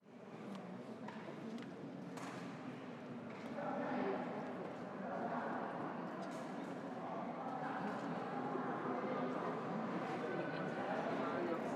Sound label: Church Ambience High Crowd